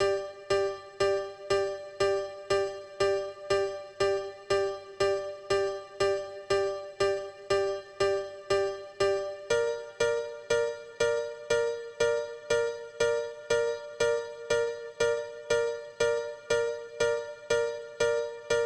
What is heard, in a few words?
Dance Piano R